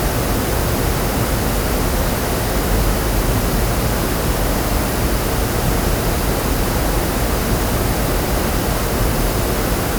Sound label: Pinknoise HATS handset; TS 103 224 Background Noise Database